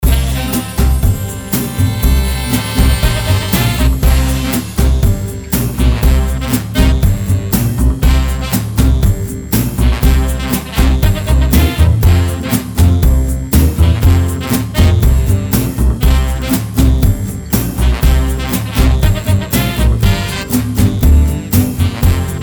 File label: Brass Band Party